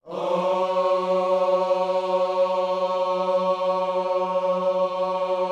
OHS F#3B, Gospel Ohs; Spectrasonics; Vocal Planet

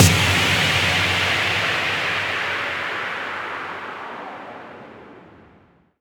Impacts, Effects

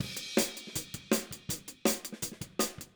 Hi-Hat, Drums, 162 BPM; Drums from the Big Room The Mixes; Take Your Toms Off; Chorus 02

